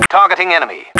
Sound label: attack; stormtrooper